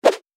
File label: little attack